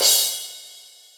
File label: kit, Yamaha Motif-ES8